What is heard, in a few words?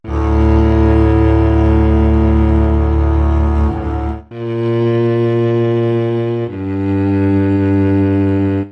melancholy cello line; MELODIC SAMPLES